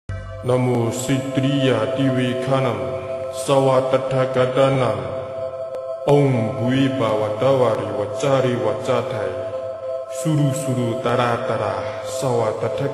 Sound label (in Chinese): 一切如来心秘密全身舍利宝箧印陀罗尼(背景音乐 念诵); 寶篋印陀羅尼